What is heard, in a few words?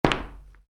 Soccer Boots: Individual Footstep On Concrete; Footstep Sound Effects; Footsteps (Sound Effects:Human); football boot; Soccer Boots : Invividual Footstep On Concrete; Stuart Duffield